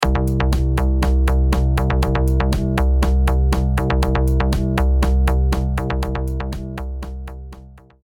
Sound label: house